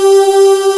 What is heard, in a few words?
VOX, CRYSTAL SYN; AKAI S6000 CD-ROM - Volume 1; SYNTHESIZER